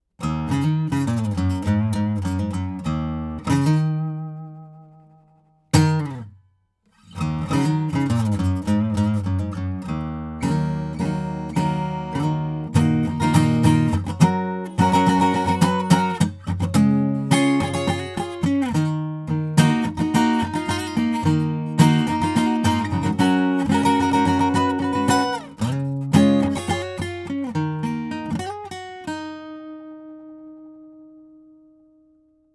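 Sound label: lct1040 acoustic guitar sound samples tube sat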